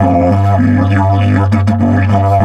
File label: DIDGE 100BPM, Didgeridoo